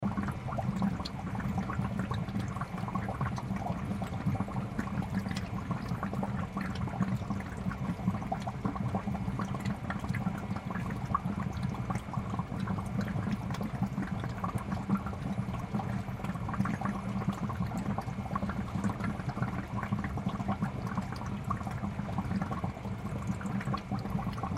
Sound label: Pet Store Fish Tank Run Loop; Stores and Malls; Animal Pet Store Fish Tank Run Loop; Blastwave